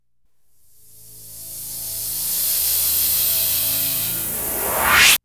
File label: FLAMETHROW; ILIO; Trance Fusion; STOP LONG